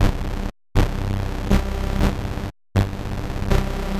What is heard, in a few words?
120 C synth, Synth Loops, Trance; Trance Explosion Vol1